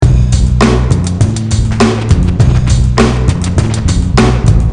FUNK INDOOR; Neocron

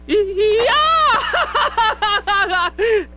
default beep; mahou tsukai tai

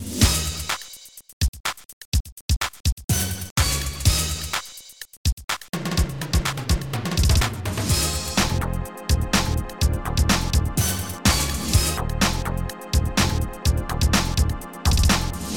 tune; BlitzBombers; Amiga

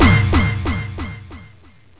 crush

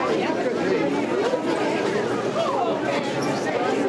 Ambi, indoor; Atennis

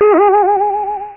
Boing

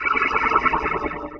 drain; allods2d

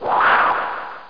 fly ball; lamsword

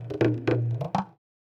PERC LOOP ETHNO 125; eJay

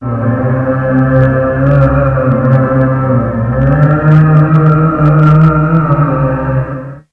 man, Synth

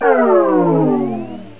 shutdown, electronic sounds; Sound Effects for Multimedia - Volume 1

